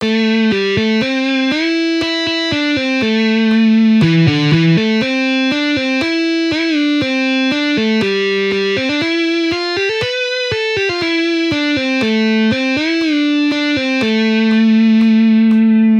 Arpeggios on guitar

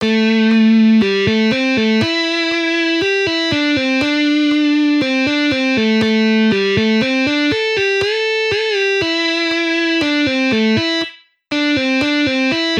String skipping, guitar

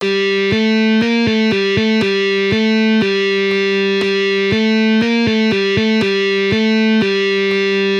Easy guitar riffs on 1 string

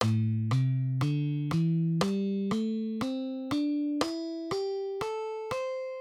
Minor pentatonic, guitar

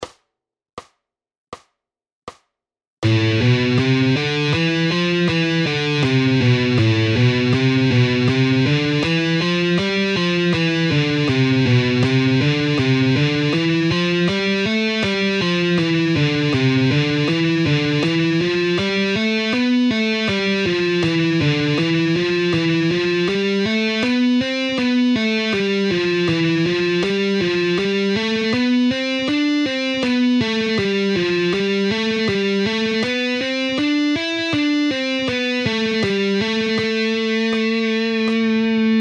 Advanced guitar exercises

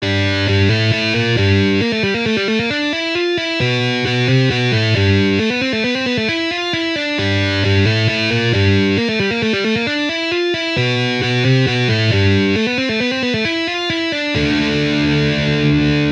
Metal guitar riffs

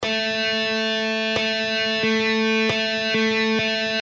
Guitar triplets

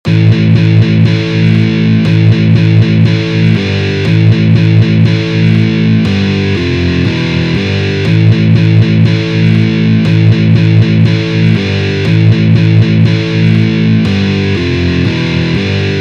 Electric guitar riffs

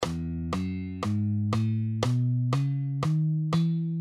Guitar notes

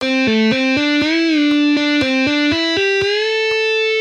Easy guitar licks

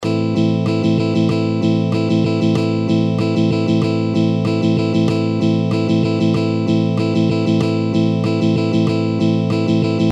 Rhythm guitar exercises

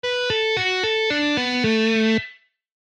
Guitar scale exercises

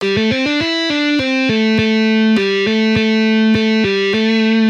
Easy guitar licks

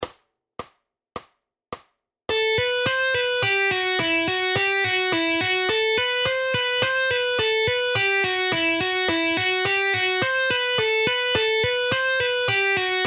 Guitar speed exercises

